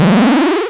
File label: powerup